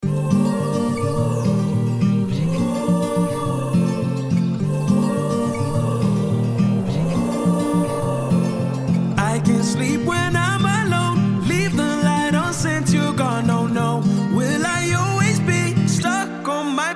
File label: Pillow (Acoustic)